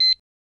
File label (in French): bip; MGE USV Infopaket Ausgabe 1-2003 (German)